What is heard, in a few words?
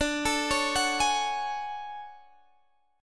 D-Major Sharp 11th, D, Guitar, Open G